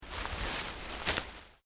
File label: PLANT LEAVES SMALL MOVE SLOW, rustling; Material components for constructing more complex sounds